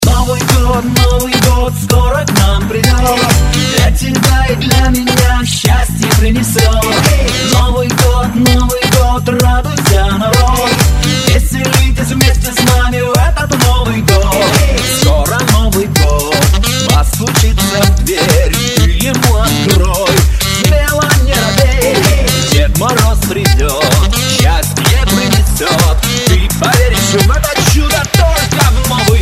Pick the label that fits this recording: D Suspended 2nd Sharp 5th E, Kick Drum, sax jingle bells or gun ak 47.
sax jingle bells